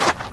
sand footstep; everness

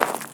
gravel footstep; everness

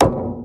metal place; everness

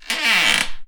creaky door open; everness

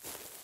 grass footstep; everness

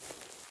grass footstep; everness

